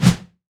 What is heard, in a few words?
projectile swoosh; Garry's Mod; tf2; passtime